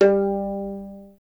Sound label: STR VLN, Solo Instruments; Miroslav Vitous